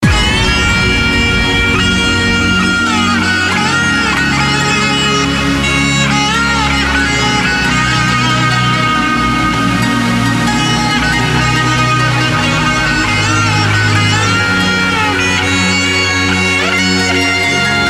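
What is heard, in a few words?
Trance Guitar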